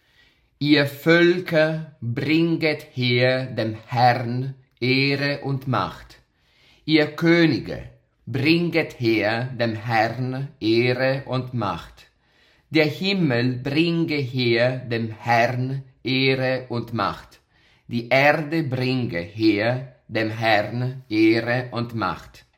Chor

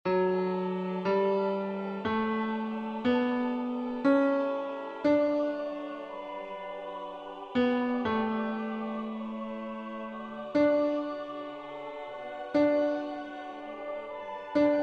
OBOE

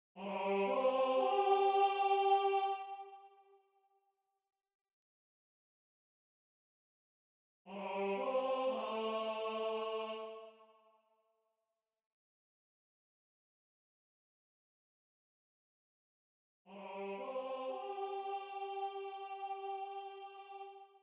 prima parte tenore

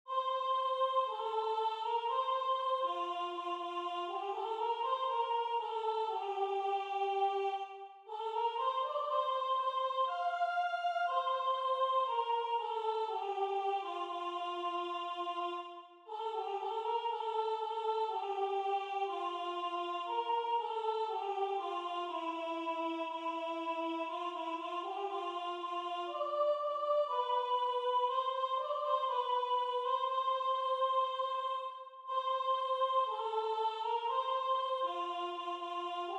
Cantate Domino Soprano

